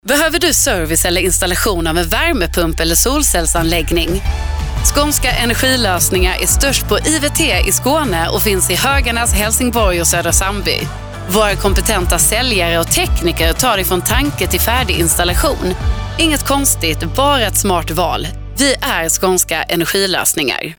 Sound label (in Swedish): Spot Musik Audio Ad; Skanska Energilosningar